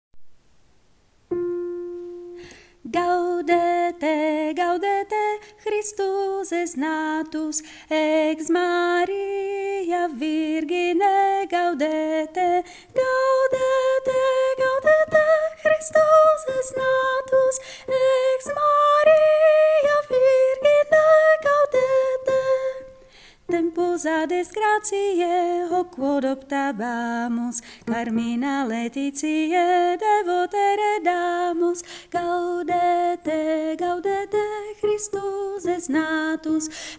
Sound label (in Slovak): sopran; Gaudete